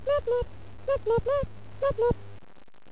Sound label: pen sound